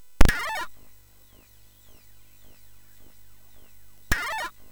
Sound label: Sound Fx, MOS6581; hvsc